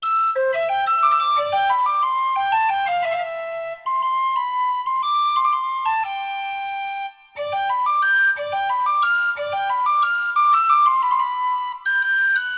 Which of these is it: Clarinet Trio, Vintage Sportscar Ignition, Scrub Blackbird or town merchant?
town merchant